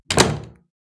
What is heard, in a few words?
Door close; Phasmophobia